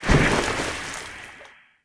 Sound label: Abyss Tendrils Emerge